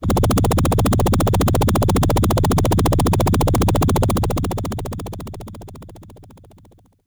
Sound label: Helicopter FX DX100 B1